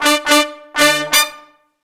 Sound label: BRASS LICKS, Disco Fever